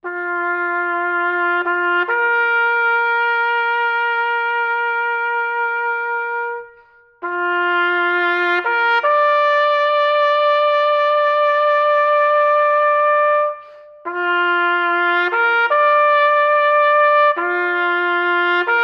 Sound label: Taps